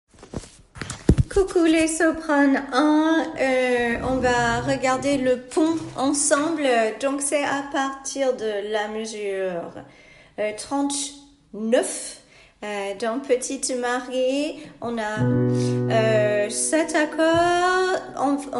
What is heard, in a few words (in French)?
chorale soprano